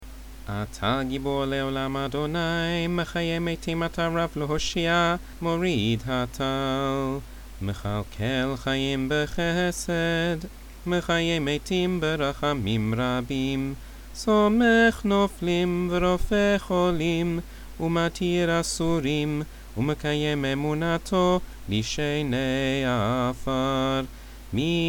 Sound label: Gvurot summer chant